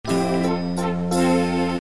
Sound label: chime orchestral; magnum